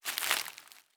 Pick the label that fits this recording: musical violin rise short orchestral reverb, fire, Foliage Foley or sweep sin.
Foliage Foley